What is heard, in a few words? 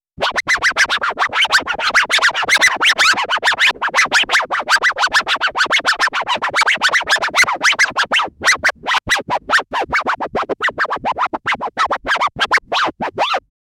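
DJ style scratch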